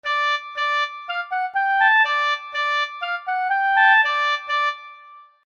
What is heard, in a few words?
sad babybear theme